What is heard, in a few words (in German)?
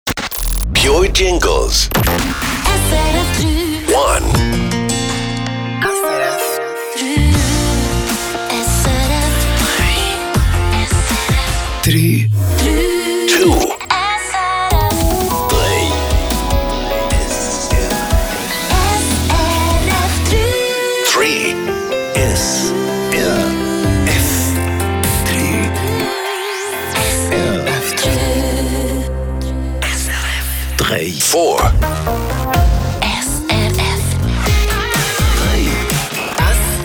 Jingles Jinglepaket; PURE; SRF 3